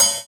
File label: Cymbal, JUNGLE BREAKS DRUM SOUNDS; ORIGINAL JUNGLE BREAKS; JBK CYMBALS